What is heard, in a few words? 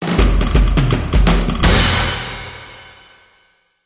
Toyland March, Sting